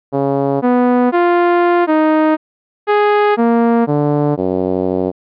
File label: Envelope Agogic